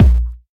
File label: wet steel kick drum sample a sharp key, kick drum single shot tuned to the A# note